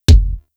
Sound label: Fire Shot Thud; Warframe; Bronco